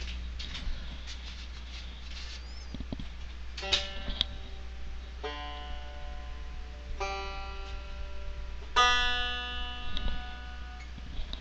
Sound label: fender, banjo